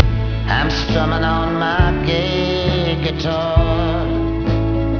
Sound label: guitar